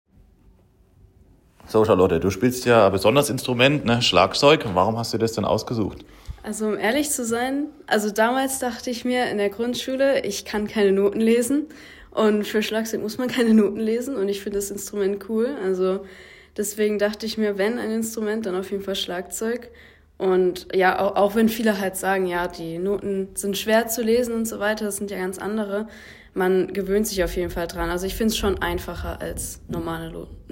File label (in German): Schlagzeug